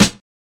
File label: smooth snare drum sample g sharp key, Royality free acoustic snare tuned to the G# note